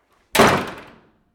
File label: Wooden Door Slamming Close